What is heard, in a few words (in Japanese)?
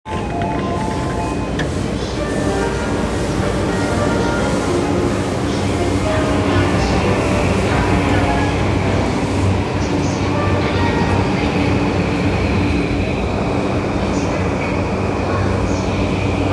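山手線五反田 counterclockwise, train sounds; JR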